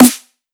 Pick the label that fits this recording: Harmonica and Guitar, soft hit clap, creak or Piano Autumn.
soft hit clap